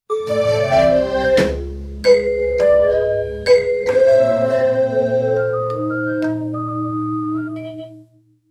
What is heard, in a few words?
happy orchestral cartoon; kids